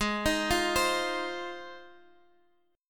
Ab 6th Ab; 16,18,15,17,x,x; Ab6 arpeggiated

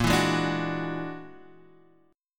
E 9th Flat 5th A sharp, guitar

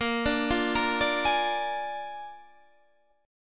A sharp 7th A sharp, Guitar

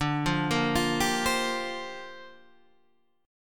A sharp 6th Add 9th

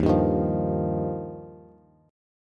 Eb Major Add 9th, Bass; Ebadd9 strummed; JGuitar

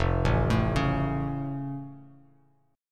F Augmented, Bass Standard; arpeggiated; 1,0,7,6; down

